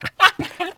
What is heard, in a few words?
Puppy Idle Speak Playful; Vocals; Warframe; Kubrow